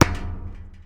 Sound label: Street Ball Metal Back; SFX Kit